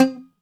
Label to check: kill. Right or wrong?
Wrong